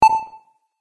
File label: one beep